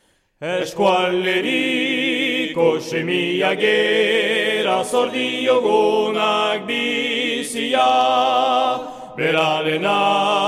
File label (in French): Chanton Piperri, trompes